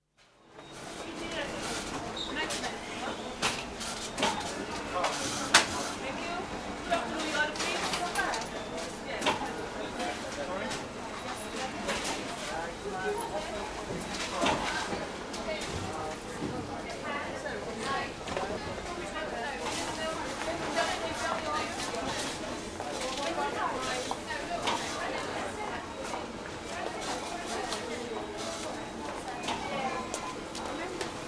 Hamburger fast food restaurant, busy lunchtime atmosphere; BBC